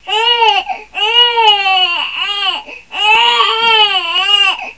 Strong cry, Infant; sv

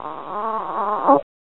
Grunt, Infant; nl

